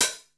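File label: Yamaha RM1X Drum Analog Kit HH OP Hard Short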